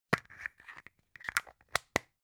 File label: plastic spray can small open close